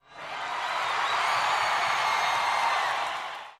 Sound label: Crowd ( 30, 000 ): with Whistles / Applause, Single Surge. Cheers Crowd, Huge.